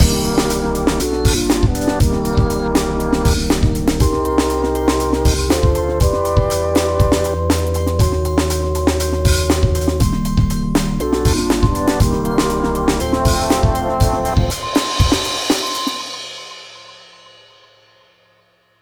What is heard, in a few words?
Strange Beat